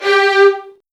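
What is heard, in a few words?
violin; Virtuoso Strings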